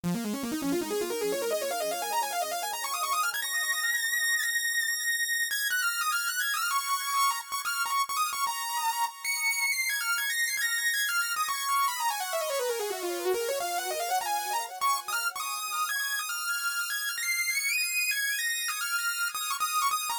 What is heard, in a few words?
Exposed Saw